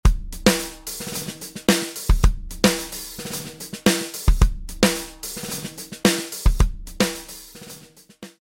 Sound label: snare with Sticks; BFD拡張音源; fxpansion; snare selects